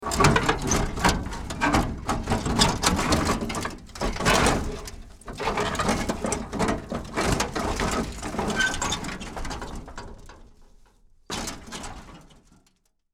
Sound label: Auto Crash Roll Down Hill